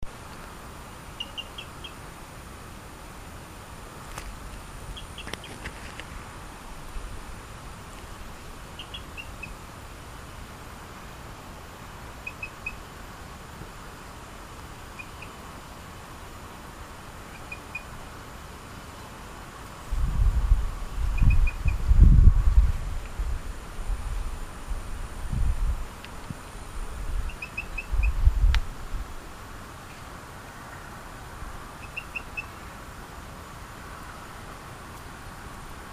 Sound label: Eleutherodactylus cystignathoides